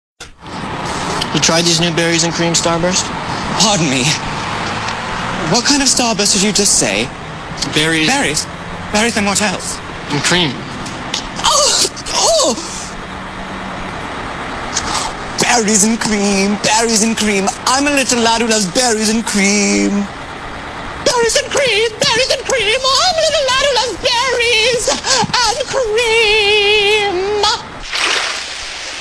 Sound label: berry msg eager, sms